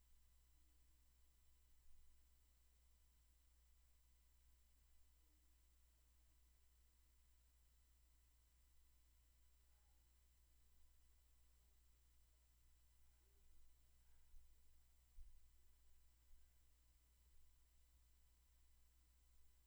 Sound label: room tone